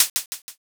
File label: hihat